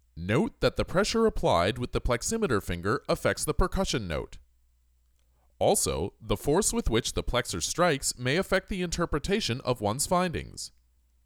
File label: Percussion